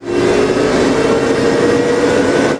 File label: first, vehicles